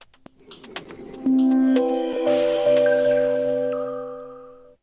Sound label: Samsung Galaxy S1 Startup